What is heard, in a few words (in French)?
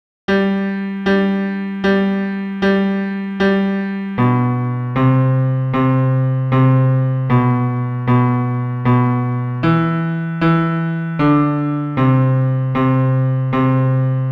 bas pian seul